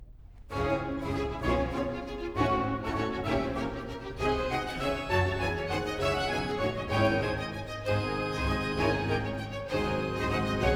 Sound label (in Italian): Aria (Bass); Cantata, BWV 40 'Dazu ist erschienen der Sohn Gottes'; IV. Aria (Bass) 'Höllische Schlange'; Bach; New York; Xmas Day + 2nd Day of Xmas